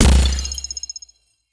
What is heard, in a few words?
dark hole